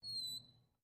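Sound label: Front Door Hinge Squeak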